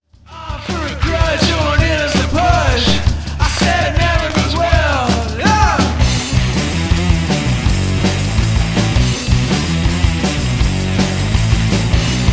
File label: Wrecked Chords